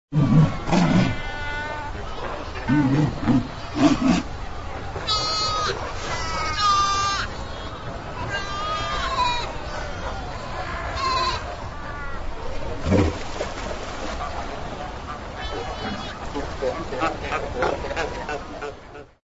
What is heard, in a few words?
duck